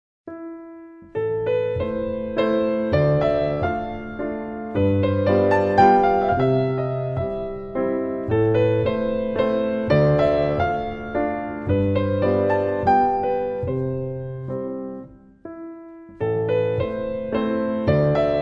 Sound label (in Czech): Valčík a moll, klavír; Fryderyk Chopin